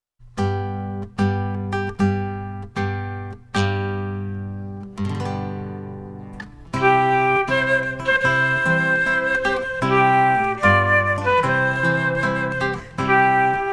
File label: adagio bridal chorus